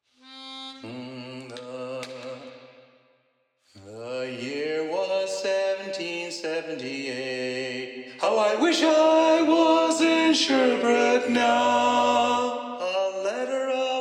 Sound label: Tenor Predominant; sea shanties